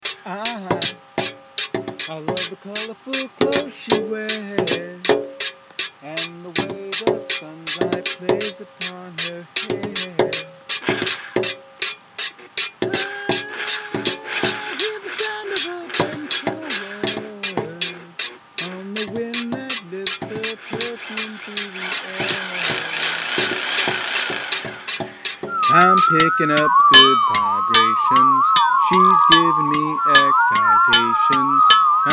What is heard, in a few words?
saw vibrations; Using a saw, destroy something beautiful beautifully. - or - Using a saw, make beautiful; Lincoln